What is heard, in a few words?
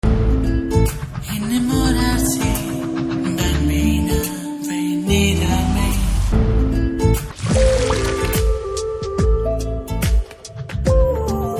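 Trailer background music Love Ringtone; Radhe Shyam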